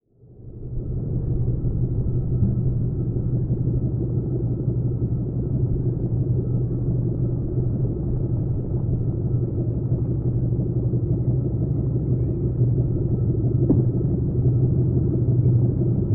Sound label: Underwater Water Ambience Boat Motor Trolling Engine Slow Pass By